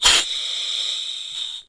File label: Gasmask release; In the Line of Duty Firefighter